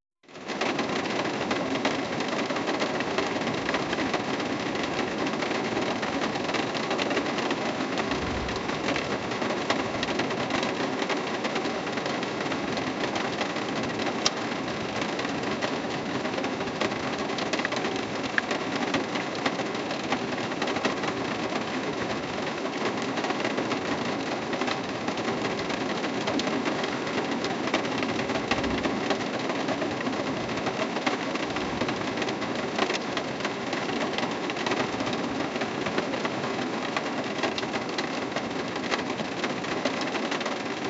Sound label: rain is heard, inside car